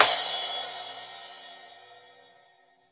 Raw Crash, Drums Acoustic; USB Soundscan vol.10; AKAI; 90s sample CD

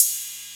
808 Cymbal; Proteus 2000; Multisamples; Digital Sound Factory Proteus 2000

